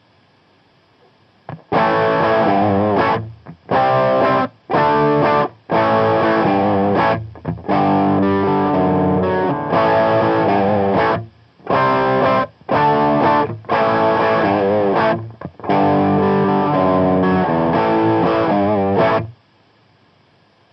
eleven rack sm57; palmer daccapo

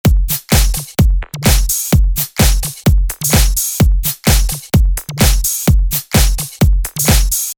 Bpm128 Acid

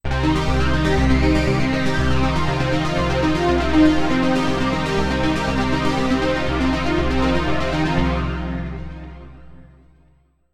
pad, Kurzweil K2700

